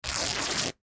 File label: Cloth Rip Tear Shred; Ripping; Foley; Blastwave